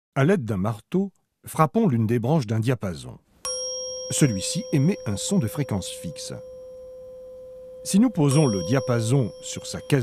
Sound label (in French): Vibrations d'un diapason